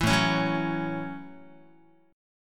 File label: A 6th A Guitar, chord; strummed